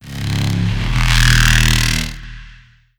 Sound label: shockwave tell bass